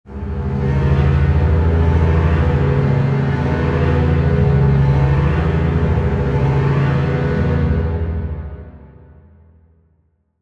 Chase Strings Low, Horror Strings

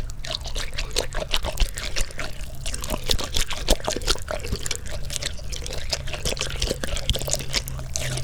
Unimaginably Uncomfortable Gooshy Sounds, melon; Micro Pack

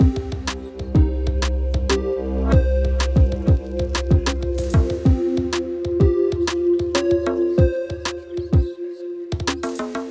Heatwave; BB 2HTC Samples Vol 4

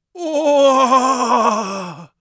on fire, Mage Voice Pack

